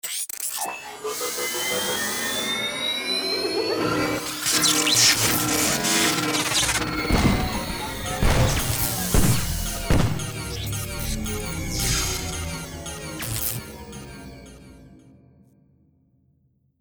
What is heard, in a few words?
time machine malfunction; time-lab